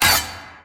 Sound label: broken shovel